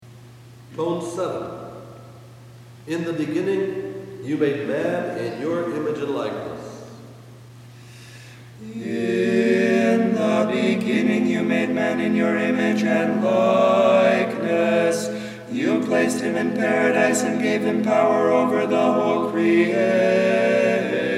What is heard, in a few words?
Stichera Tone 8; PARASATAS (TEACHING)